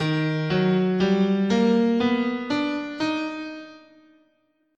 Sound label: D sharp Augmented, scale